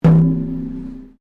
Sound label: METAL IMPACT BONG; Materials